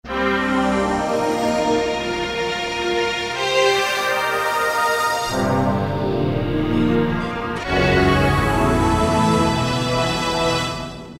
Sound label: SUSPENSE, Kawai K1m; Multi